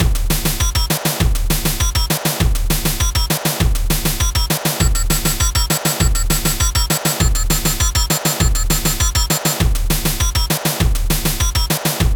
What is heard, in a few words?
Noise; Noitu Love 2